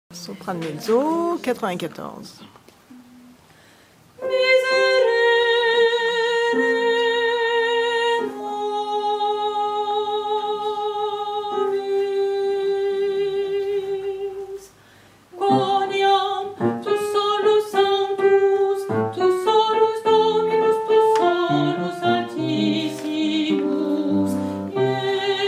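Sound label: mezzo soprano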